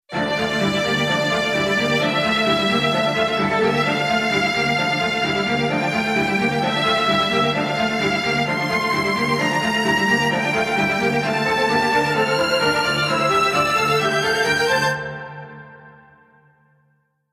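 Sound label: Strings Homophony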